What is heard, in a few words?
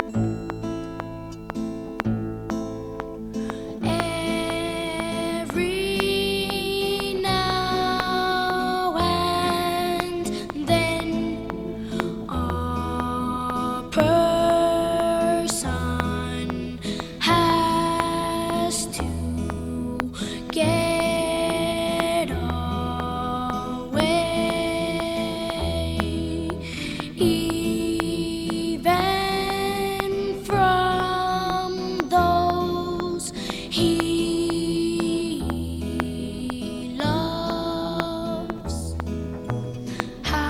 Vocal